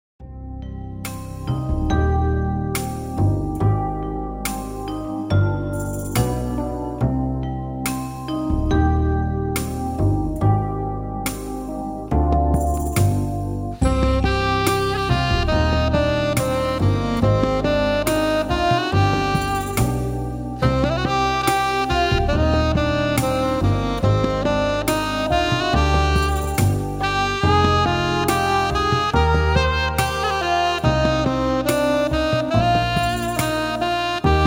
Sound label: christmas jazz classics; Weihnachtspraesente